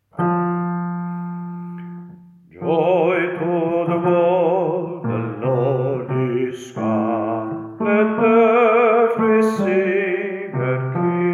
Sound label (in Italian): bassi; joy to the world